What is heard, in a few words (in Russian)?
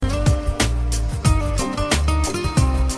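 романтическая смска в ритме румбы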